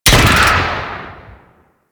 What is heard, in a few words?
Rocket Launcher Fire; Enemies; Warframe; Kela De Thaym